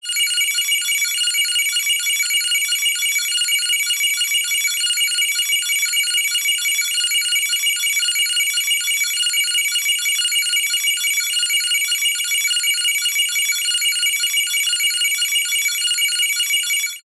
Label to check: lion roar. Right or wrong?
Wrong